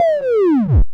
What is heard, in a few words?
Sound Fall Dull; arcade-canvas; ninja-runner